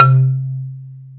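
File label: xylophone C 2; AKAI S-Series CD-ROM Sound Library VOL-8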